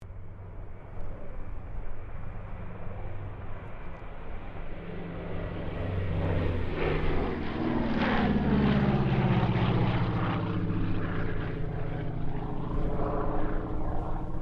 AIRCRAFT LOCKHEED HUDSON PASS WIND, Hudson twin engine light bomber; Airplanes; Vehicles